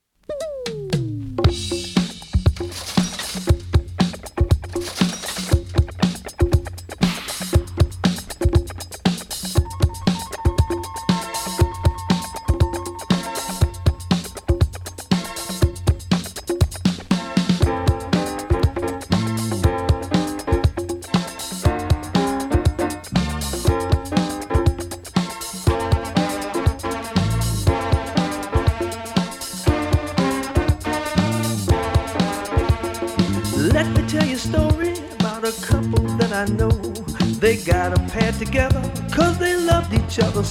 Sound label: deep disco; beatstream